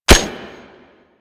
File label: Mech, Weapons; Lato Vandal